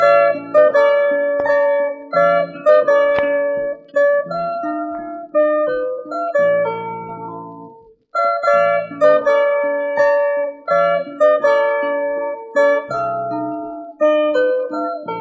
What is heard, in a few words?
guitar; Phonky